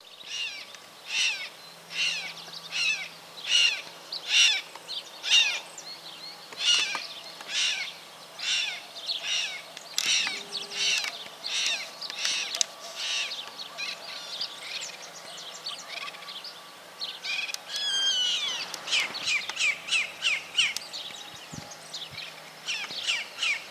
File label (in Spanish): chimango voz; aves